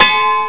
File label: CLANG